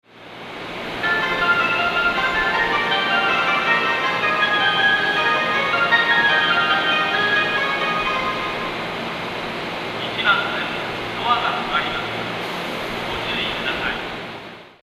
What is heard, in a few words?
ebisu melody, Tokyo Train Tunes; japan